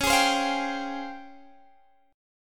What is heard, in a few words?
C sharp Major Sharp 11th, Guitar Open G